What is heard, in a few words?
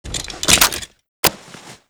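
weapon foley at4 raise first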